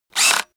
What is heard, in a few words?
screwdriver free short; maschinen; schrauben drehen hydraulik